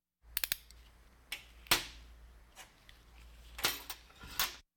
Hospital effects

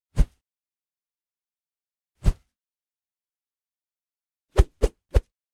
Swish (Heavy); Hollywood Edge; Martial Arts and Human Impacts

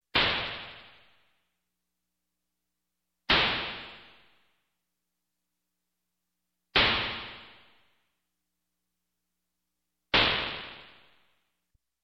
Space Gun, Several Single Shots, Electronic; Sound Ideas 2000 Series

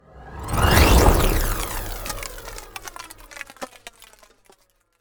Ice Spell Whoosh Ice Rain, Cold Whooshes; Arctic Aura

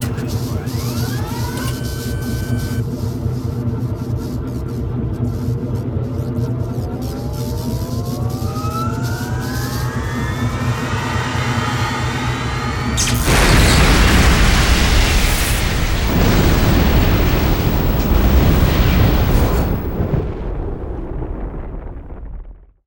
Explo Generator Powerup Explo; Destruction

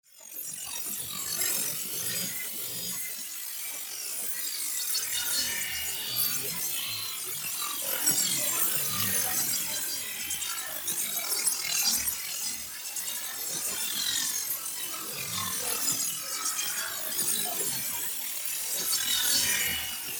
NEON PARTICLES SMALL SLOW; Quasar; boom library

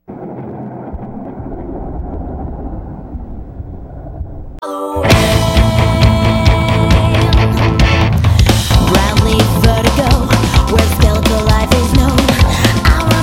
music Halloween Scary Sounds Haunted Forest; 1001 Sound Effects; Halloween Scary Music and Sound Effects

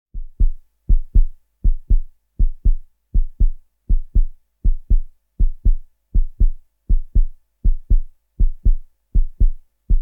Heartbeat Fast; KMP Film Video Sound Effects

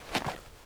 dirt jump; firefight

